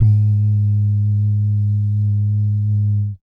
bass dums; Spectrasonics Vocal Planet; Jazz FX

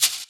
Shaker; Equipped Music; Loungin House

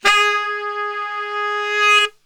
G#2 sax; Giga Samples Collection

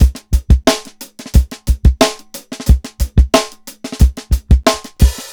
SWUNK, breaks, drums; Basement drums vol 2

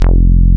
JNO SPIK F2, SPIKE BS

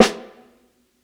60s SNARE SOFT; Basement drums vol 2

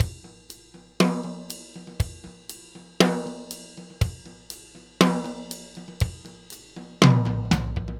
New York Loop, Drum Tools, Laidback

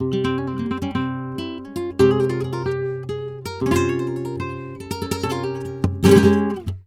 flamenco guitar, tango; Best Service ProSamples vol.46 - Flamenco

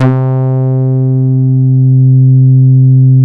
SH PADS; Pro-Rec - Synthsonic; JV PADS

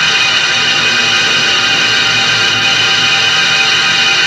A#3 VAPOR; Northstar; The Wizard VOL-1

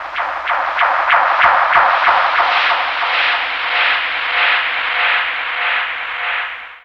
Tape Feedback; Sonic Foundry (Sony Creative Software); Electronic Point Blank

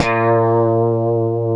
B 2D, Guitars; Spectrasonics; Hans Zimmer Guitars VOL-1; 90's Sample CDs; COMP POP+VIB

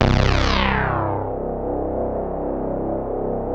LEADS; Masterbits; Vintage Voltage

